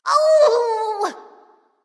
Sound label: ouch; cupid